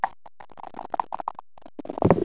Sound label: die roll; MPG-Net (USA)